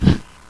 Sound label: fire off; xash3d fwgs